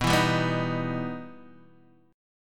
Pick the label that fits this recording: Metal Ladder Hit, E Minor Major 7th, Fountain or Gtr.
E Minor Major 7th